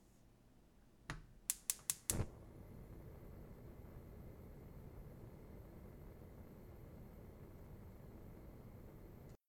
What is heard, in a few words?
Stove Light Burn